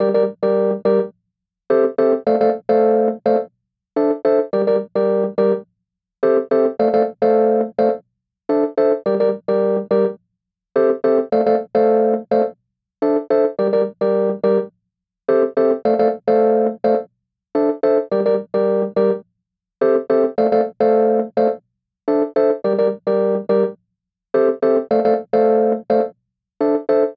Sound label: Piano Loop Gm 106 (dry)